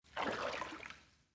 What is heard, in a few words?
ocean flicking; LG G Flex